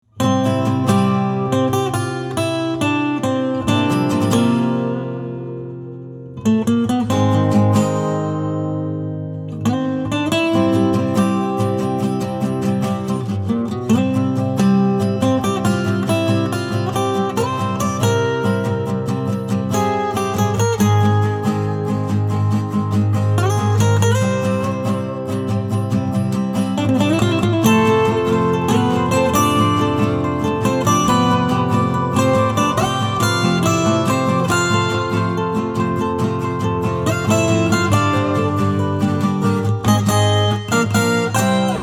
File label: Acoustic Short